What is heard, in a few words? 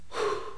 exhale